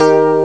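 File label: electric piano accord; Amiga Soundtracker Sample Packs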